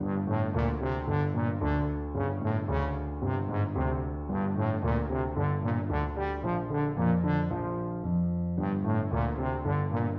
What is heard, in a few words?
trombone